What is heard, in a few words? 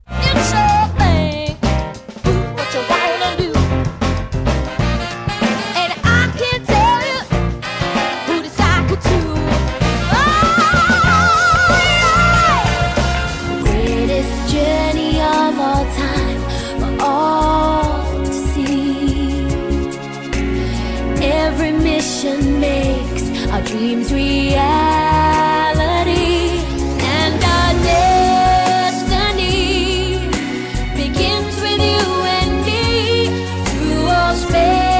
Singing